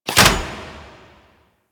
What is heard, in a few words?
Fist Ape Sprint; Enemies; DE; Warframe; Tyl Regor; Fists